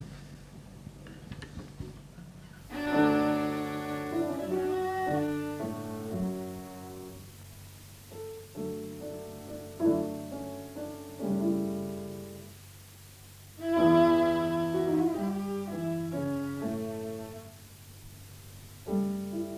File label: Trio for clarinet, viola and piano